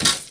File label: METAL